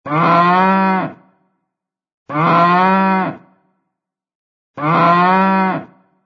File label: a cow